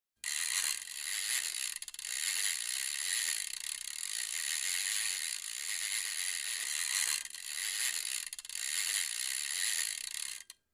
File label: Fishing Reel Casts, fishing pole